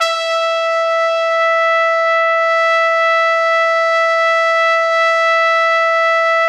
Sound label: E4, Pop Brass; Best Service ProSamples vol.19; AKAI